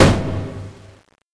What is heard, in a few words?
Book Bang; Learn to Fly 3